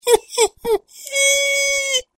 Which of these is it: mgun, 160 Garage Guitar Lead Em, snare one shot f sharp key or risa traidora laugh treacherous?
risa traidora laugh treacherous